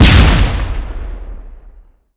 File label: flashbang; czero opforce